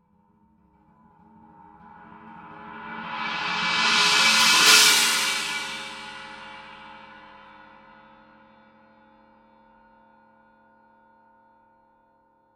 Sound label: 18 heavy china swell; signature